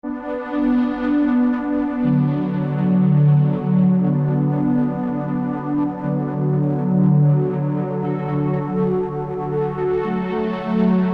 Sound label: Bm 120 Korg chords